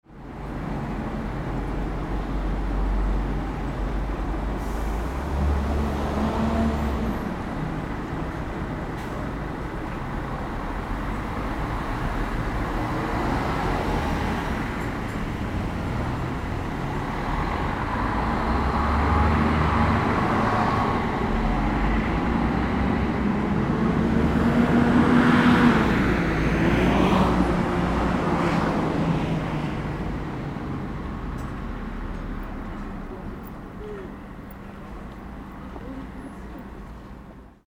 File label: city street noise